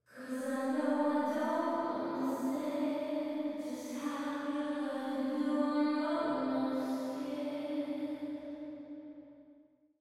Wet But Upfront, Unnatural Verb, Solo, lead vocals